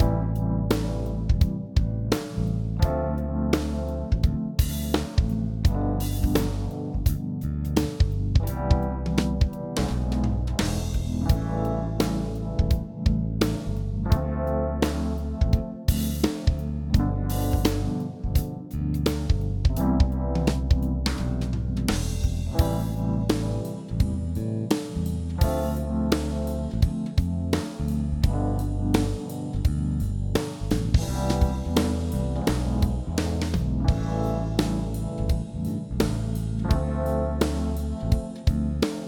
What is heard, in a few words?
Lounge Loop; Metaverse Keeper